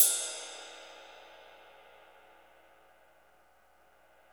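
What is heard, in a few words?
cymbal ride, Roland; Club-50 - Foundations Roland; Ext.Snare 3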